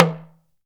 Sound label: TLK DRM, Heart of Africa; Spectrasonics